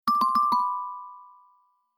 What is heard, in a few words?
warning